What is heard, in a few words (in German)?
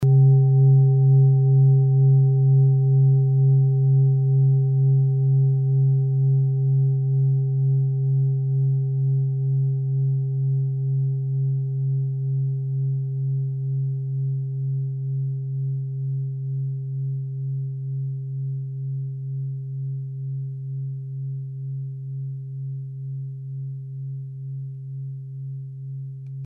klangschale set; Klangschalen-Chakraset; Gewicht = 1190g; Durchmesser = 21,9cm; Höhe = 10,2cm